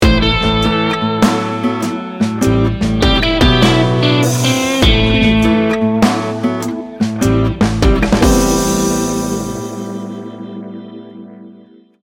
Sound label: blue collar blues sting bumper; Laid back, edgy blues. Featuring a signature acoustic guitar riff, layered with smoky slid; Confident and determined. Memphis, Rural South, Americana, Backwoods, Swamp, Moonshine.